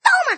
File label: dragon punch